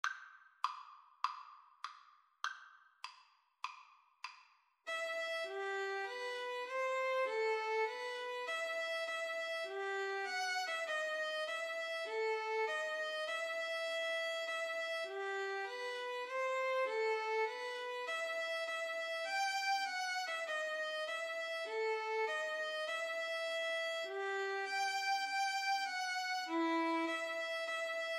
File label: deep love, Violin Flute Duet